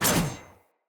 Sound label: Sword Whoosh Heavy, Weapons, Player, Swords; Garren Sword